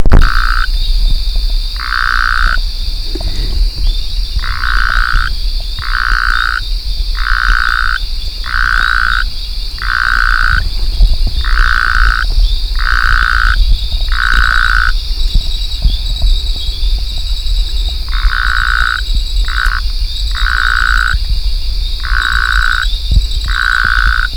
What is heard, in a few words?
Phyllomedusa azurea